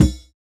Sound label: Kick, jungle breaks drum sounds